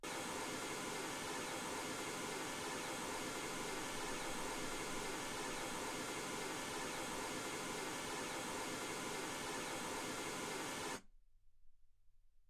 mls 48kHz

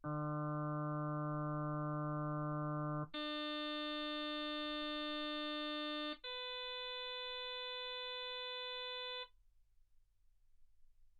harm sinus 48kHz

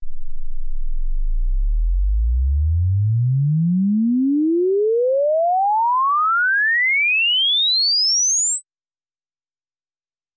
exp swept sinus 10Hz 24kHz; OmniPower4296

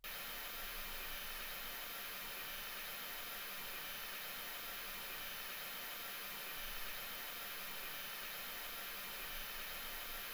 mls16 48kHz

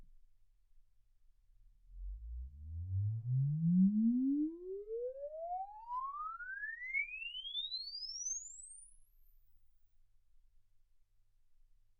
exp swept sinus 10Hz 24kHz

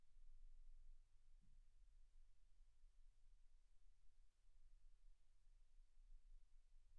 silence 48kHz

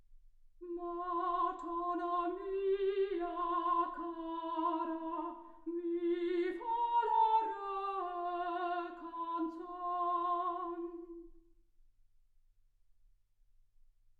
soprano

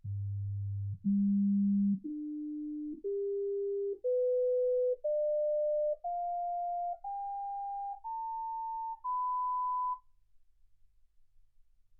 sinus tones 48kHz

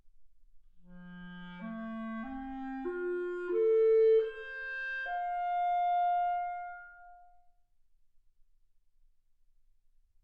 clarinet

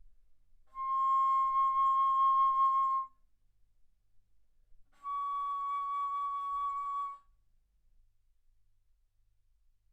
Bass Flute ff C6 Db6

